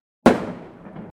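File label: sound of firecracker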